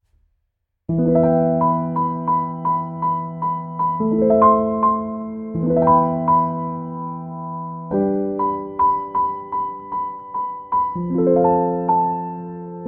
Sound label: Piano Instrumental